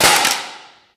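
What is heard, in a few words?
physics clipboard impact large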